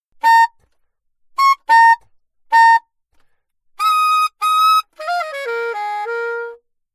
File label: altosax